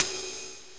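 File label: cymbal ride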